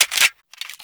colt1911 reload clipout

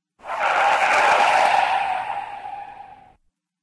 Truck Turn; DCS

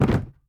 wooden box deploy; Rust

